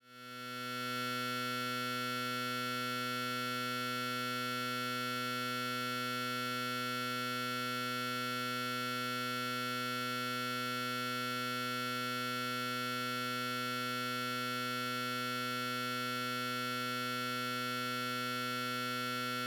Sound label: Fluorescent Light Ballast, Flickering; Haunted Bloodlines; SFX Producer; Lights